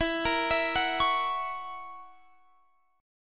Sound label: Gb 7th E, chord